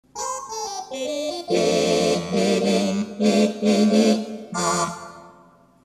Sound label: 4' Tibia 8' Kinura, theatre organ